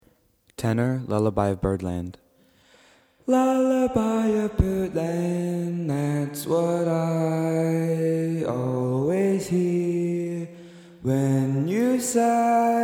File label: tenor